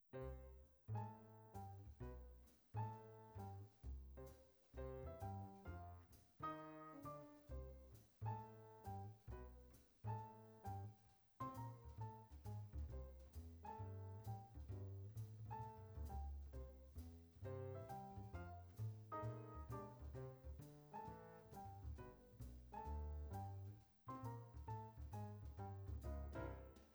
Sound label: Music at -30 dB into 20 Bit With High Resolution Dither